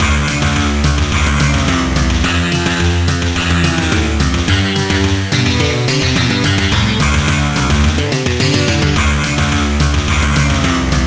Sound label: Rock